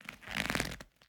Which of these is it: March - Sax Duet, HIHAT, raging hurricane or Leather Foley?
Leather Foley